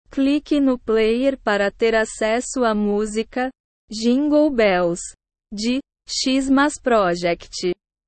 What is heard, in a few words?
jingle bells